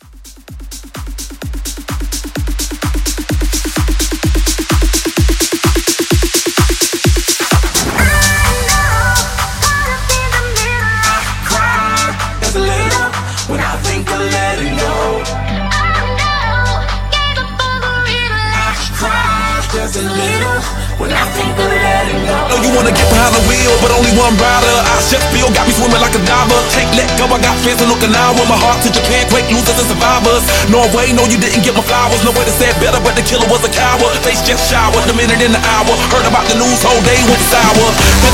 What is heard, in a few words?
Mashup, 128